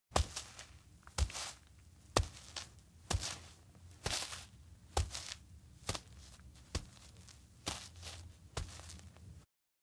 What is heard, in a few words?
steps outdoor walk pain; jet; anemoi